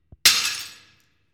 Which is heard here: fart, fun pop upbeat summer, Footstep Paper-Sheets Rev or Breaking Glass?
Breaking Glass